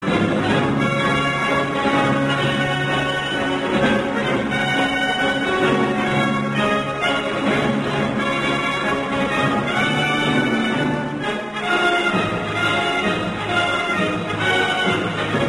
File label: Music